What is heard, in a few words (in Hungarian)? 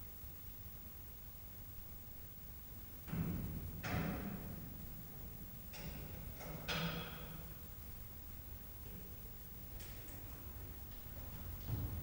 rácsozat kinyitása; miskolci zoo 2009